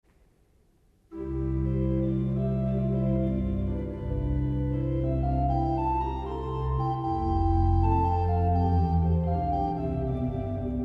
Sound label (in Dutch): Holfluit met Subbas en Bourdon